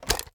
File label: ar raise first end; weapons; gmod; viper